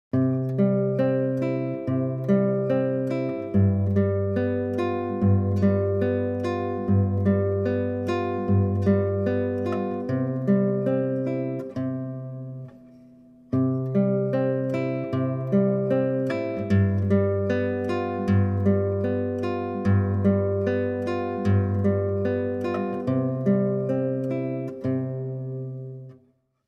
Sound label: arpeggio